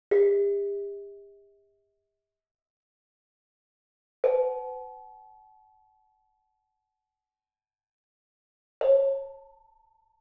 Gamelan; Propeller Island - Complete Gamelan

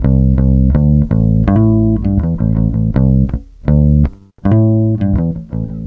BASS, BASS GTR

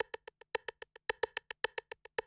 110, Classic Drum Loops

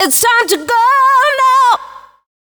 FEMALE, Dance Vocals

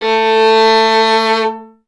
STR VLN, Vln Detache; Miroslav Vitous

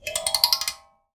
Finger Gesture, Finger Prepared Kit; Battery 3 Sample Kits

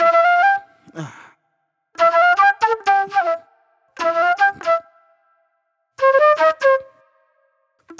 Super Soul Flute

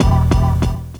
POW, Synth FX Misc

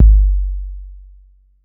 BD 808

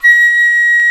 FLT FL A#6, Flutes; Roland LCDP04; 90 s Sample CDs; C Flutes 3-8; C Flt Sh 6&7

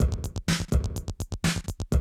125, Classic Drum Loops

